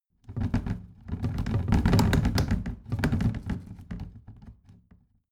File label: plastic trash can rattle